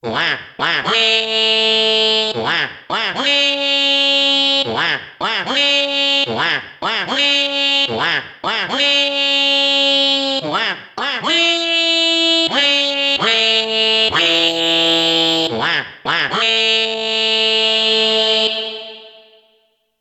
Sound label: Taps; Waluigi